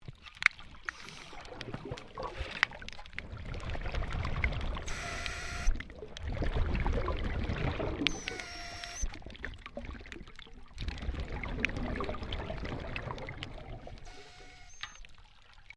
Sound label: MAINTAINENCE WORK UNDERWATER DIVER BREATHING; Maintainence divers off StKilda Pier; Construction